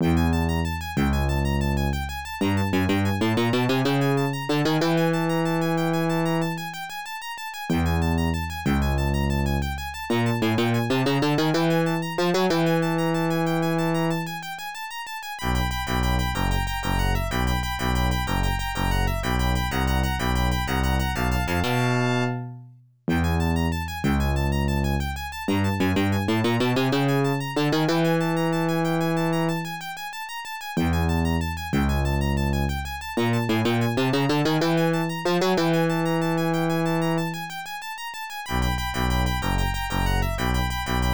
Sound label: Unused BGM